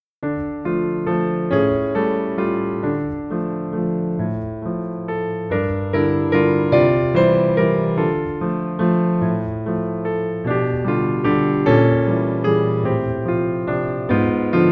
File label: Piano